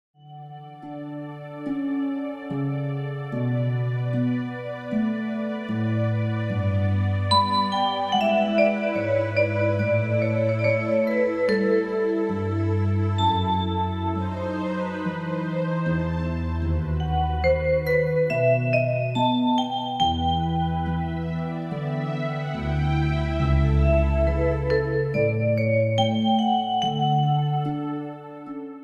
orchestral suite no 3; baby einstein; disney

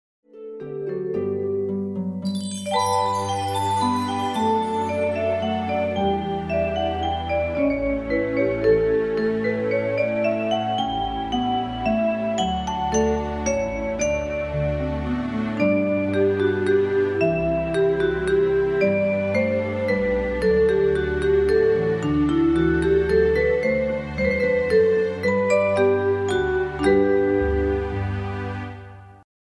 minuet in g; baby einstein; disney

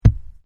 thud

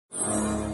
match 4; john carter; lost symbol

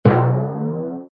innertube; camp rock; rock n row

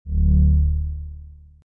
sub nav

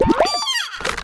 rollover musical painting time; activity center; backyard; preschool time

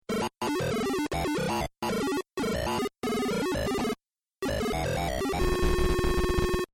kill screen; fix it felix; wreck-it-ralph

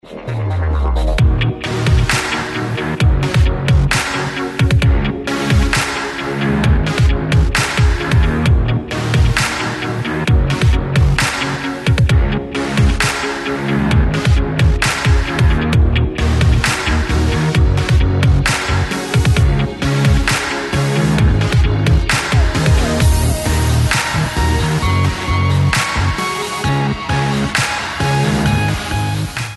karaoke; disney

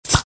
bubble rollover; camp rock; rock n row

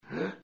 windup; snow white

